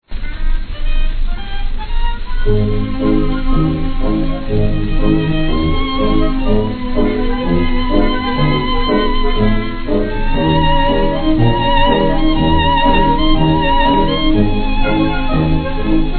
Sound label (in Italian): Orchestra, Blues